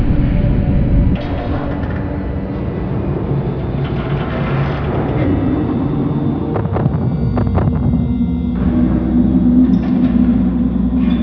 broken ambience

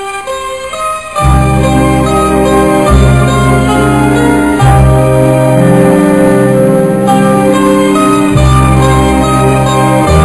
tune; chaos

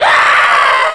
burn; player

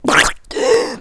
gasp; street walker

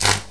welder; xatrix

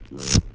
zap

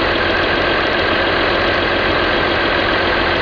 engine; lmctf

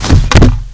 death; opentdm; Zumlin

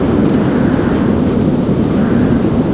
hard strong wind

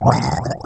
gurp; Zumlin

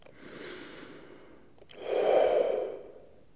evil

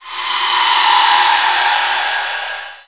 beam out; bwidow

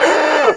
death; vortex; slith

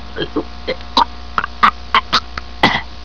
gurp

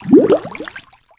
flipper idle; openffa

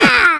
pain

